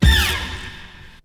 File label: DIRT HIT, STAB; SoundFactory; SMPMANIA